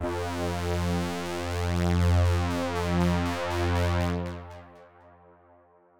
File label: analog F3; analog server